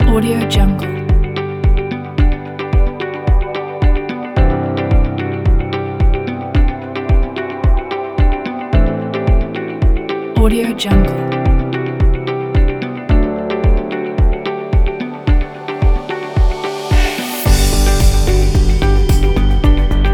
motivational dreamy corporate

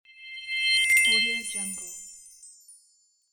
shining transition; welltone

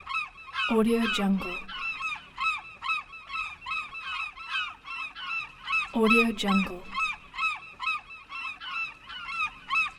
seagulls on beach

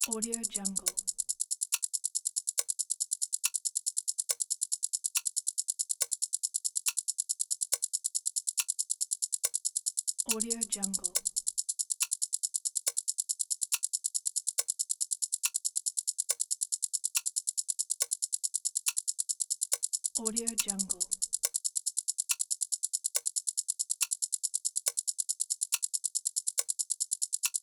the stopwatch fast ticking; blackdragonfx

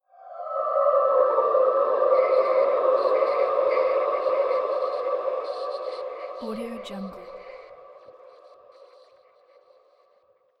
moving whispering ghost

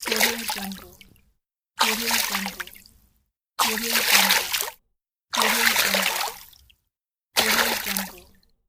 water splashes; fx-library

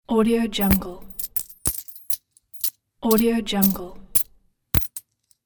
coins

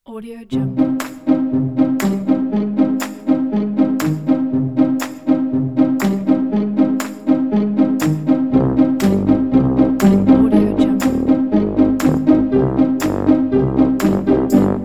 comic logo

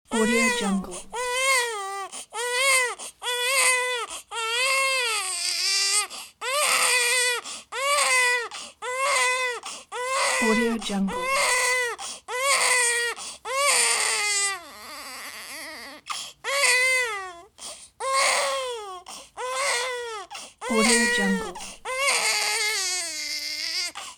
newborn baby crying

